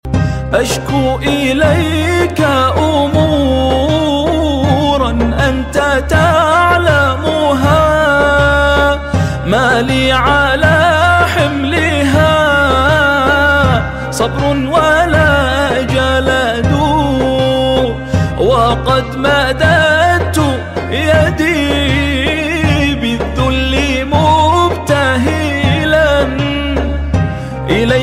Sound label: islamic Ringtone